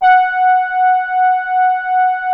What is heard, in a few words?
F5, SOFT BRASS